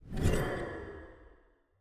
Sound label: environment mob despawn